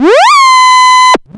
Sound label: Arp one shot, SFX; Sonic Foundry (Sony Creative Software); Electronic Point Blank